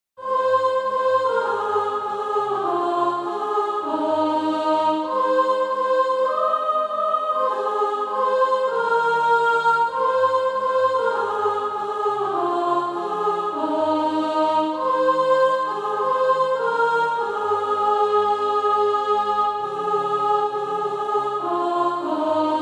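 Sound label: soprano, SATB Practise Music; Down at the Cross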